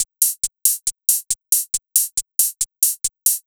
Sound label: Hi-Hat Loop, Drums, Dance Beats